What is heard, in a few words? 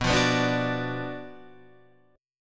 F sharp Major 7th Suspended 4th Sharp 5th B